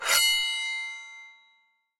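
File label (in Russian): металлический звон достанного меча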